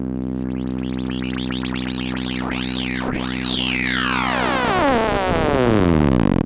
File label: SPACE WARP; Greytsounds VOL-1; STEIN-PARKER